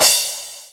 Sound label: Cymbals crash; Vengeance Samples